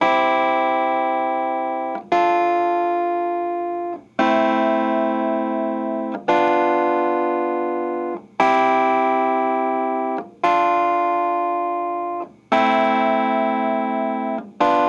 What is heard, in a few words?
Cruisin' Disco Clavinet, 70s Analog Funk; Sonic Foundry (Sony Creative Software); What it Is!